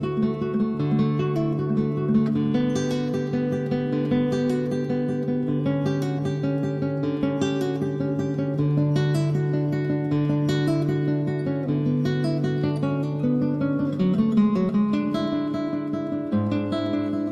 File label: Cello Suite No. 1- Prelude